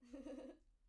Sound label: laughter; IEEE AASP